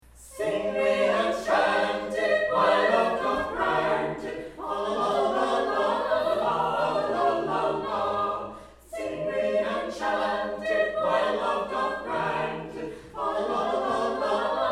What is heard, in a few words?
Sing We and Chant It; recital